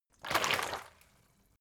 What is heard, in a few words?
bottle water big upend; berufe